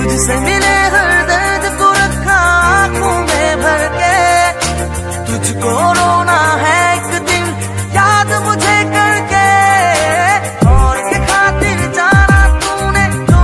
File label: Sad Song Ringtone